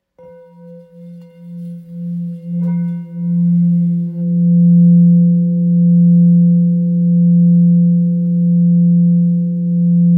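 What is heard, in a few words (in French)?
Bol full moon lingam fa, bols chantants tibétains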